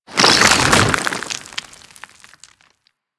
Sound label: Desert Skate Attack Foley A; Warframe; Attacks